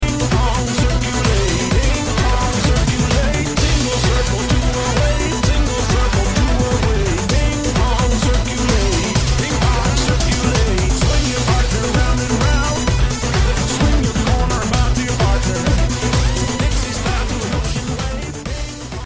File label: ping pong circulate; kill la kill ost